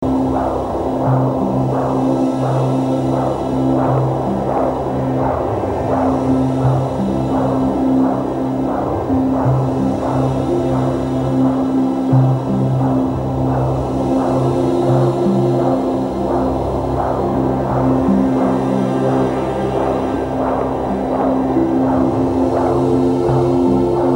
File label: WOMB